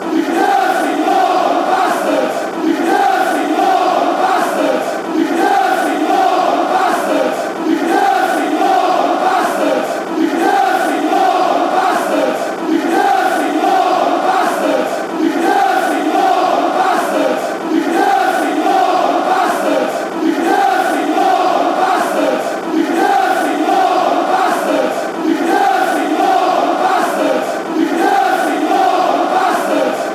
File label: dirty northern, fanchants